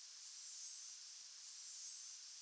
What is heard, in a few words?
insect